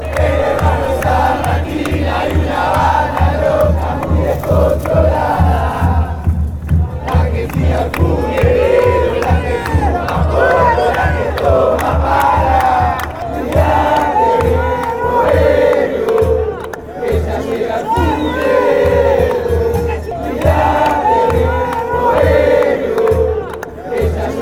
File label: in the san martin suburb, chants